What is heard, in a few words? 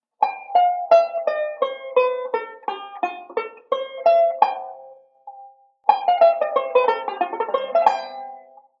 Modal Sequence, banjo